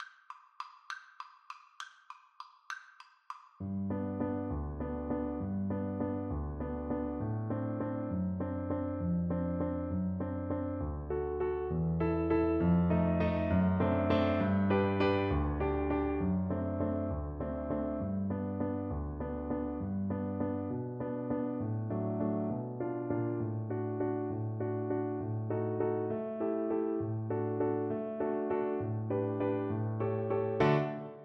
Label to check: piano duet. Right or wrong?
Right